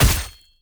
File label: attack light; bloom minigame